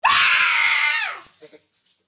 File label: Scream